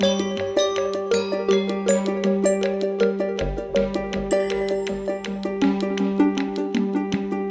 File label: Game Intro Extraction loop